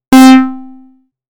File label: Bass, synthlead